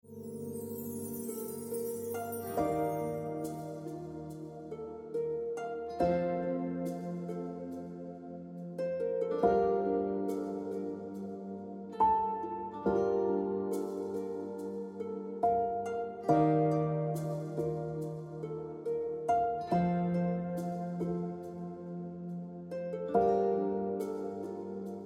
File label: Emotional Life Ringtone Piano Music